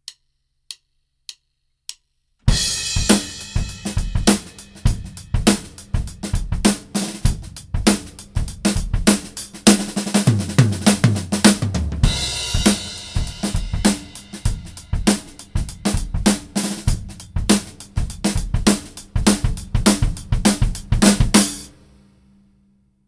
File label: Swung Funk Groove